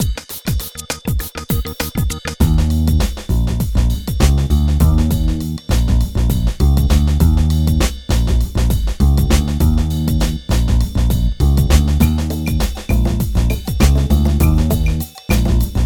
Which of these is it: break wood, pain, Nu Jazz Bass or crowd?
Nu Jazz Bass